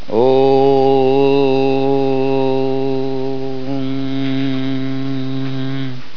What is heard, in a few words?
Chant